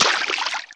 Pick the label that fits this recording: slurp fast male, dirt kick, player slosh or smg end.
player slosh